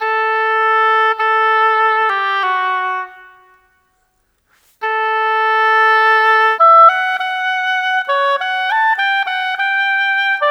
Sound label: Oboe, Orchestral, Rock Pop; Sonic Foundry (Sony Creative Software); Orchestral Series VOL-4 - Rock and Pop